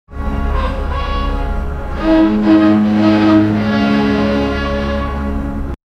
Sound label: wind blowing dust settlin